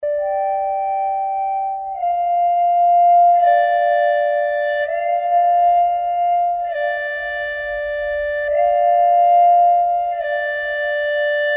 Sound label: quiet journey